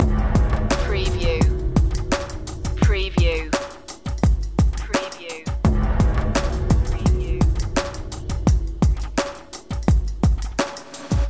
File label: gong walker